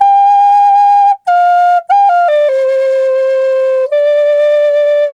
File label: G2 93, Didgeridoo; Big Fish Audio - Didgeridoo and Other Primitive Instruments